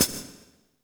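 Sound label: hi-hat, Real Mega Drums; Best Service; Real Mega Drums VOL-1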